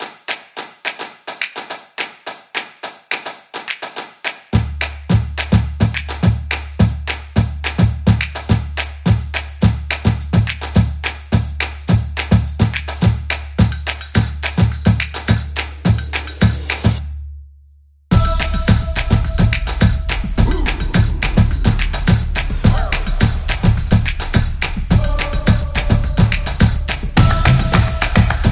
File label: Drums Fun; casual game